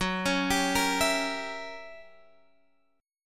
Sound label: Gb 7th Flat 9th Gb Guitar Open G